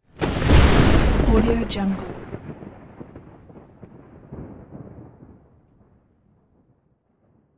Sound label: artificial thunder